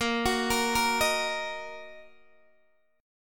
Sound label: A sharp Suspended 4th Sharp 5th Guitar DADGAD